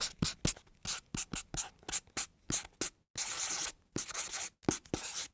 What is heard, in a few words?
Write on Cardboard with Marker; Office Supplies